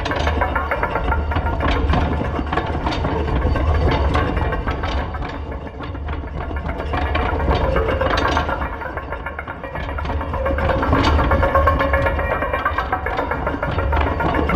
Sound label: mechanical rotator gear close; Titanfall 2